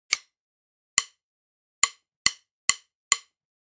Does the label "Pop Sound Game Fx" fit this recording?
No